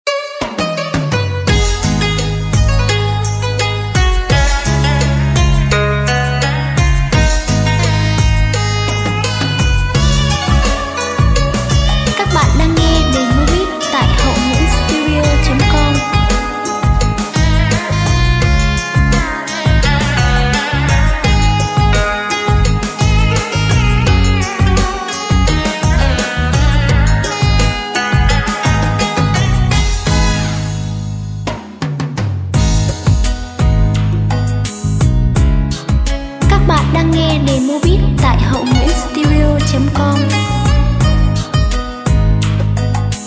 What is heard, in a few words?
beat, playback